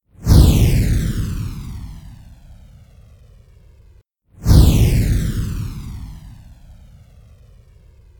Fireball

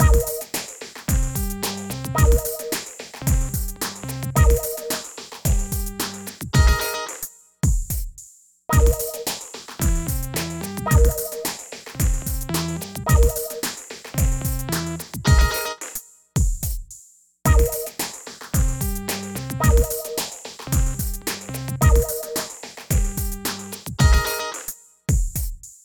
Chunky Bassline

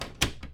Latch

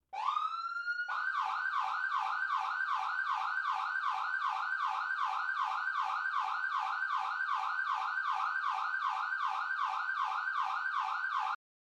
Police Siren

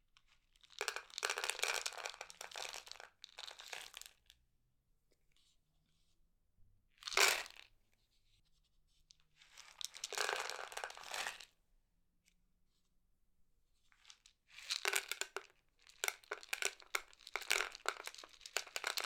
Pouring Cat Food

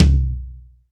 Pearl Mlx 16 Floor Tom

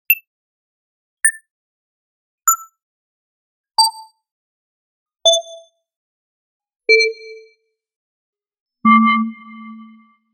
Indicator Announcement

